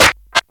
old school rap clap sound f key, Royality free clap single hit tuned to the F note; single hits one shots